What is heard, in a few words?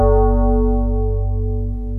DIGITAL SYNTH; OMI - Universe of Sounds